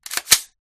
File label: switch; mkg45; guns